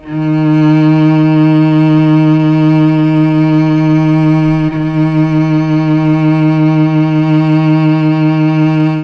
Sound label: D#2 CELLO, Mellotron; CHMBLN CELLO; Mike Pinder - Mellotron Archive CD